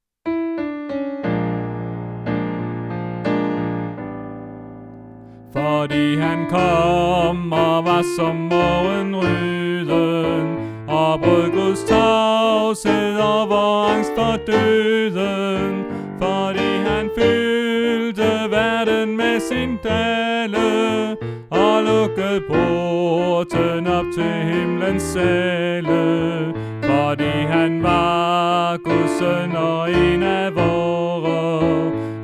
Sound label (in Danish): vi har et haab Alt; oevefiler